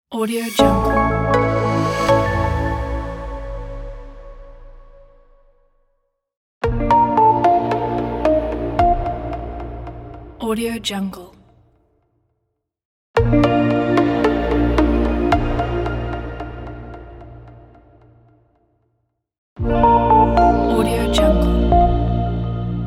corporate logo reveal

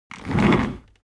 object chair office worn trundle fast

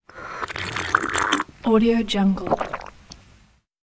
drinking; chicasound

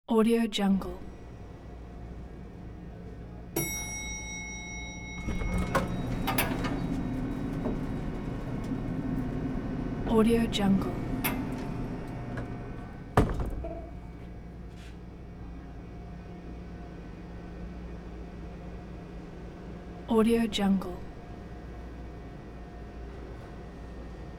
elevator ding open close

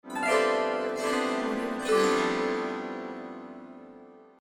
harp glissando descending

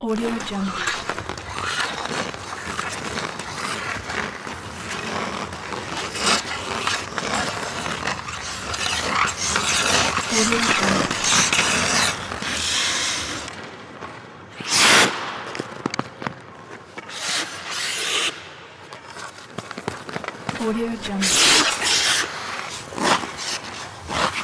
ice skating hockey player

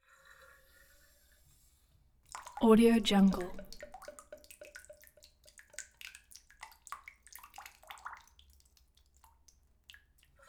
bath fill faucet run water flow drips; prosoundfx

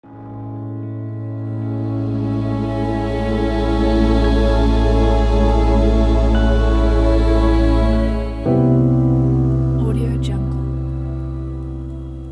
yoga logo

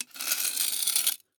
knife scrape; prosoundfx

